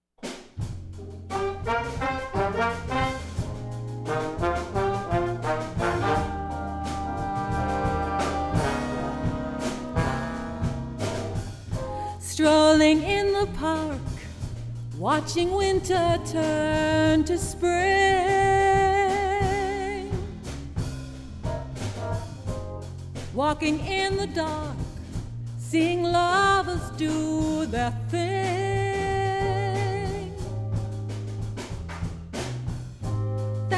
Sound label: Jazz Combo Vocal; Premier